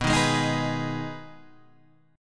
B 6th B down, chord

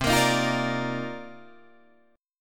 C Minor 11th C Guitar Drop D

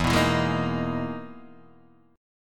E-Major Sharp 11th E, Guitar Half Step Down

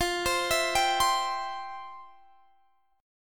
C Major F; C/F; arpeggiated

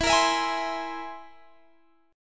C Augmented 7th E

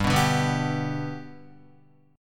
G Major G down Guitar 4F 4A 5C 5G 5C 6E; G strummed; JGuitar

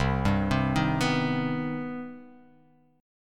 C Minor Major 7th C; arpeggiated; JGuitar

